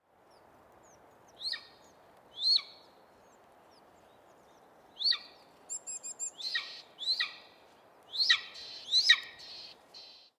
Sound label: Golden Eagle Aquila chrysaetos